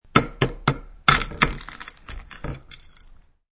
Egg Opn Knfe, Cracking open boiled egg with knife.; Kitchen Sound Effects; Sound Effects:Household